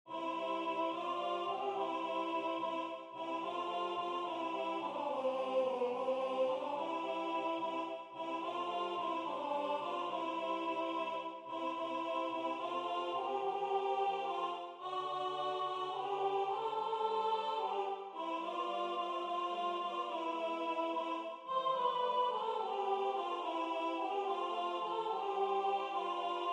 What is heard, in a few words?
alto; In That Poor Stable; Waiheke Island Choral Society